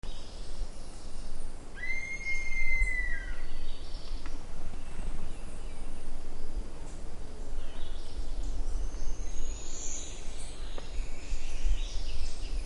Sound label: plumbeous hawk